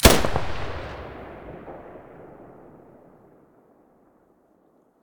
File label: muzzle vector stereo, weapons